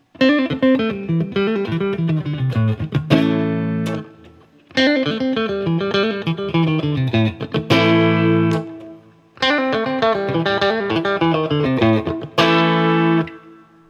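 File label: Guild X500T Riff, guitar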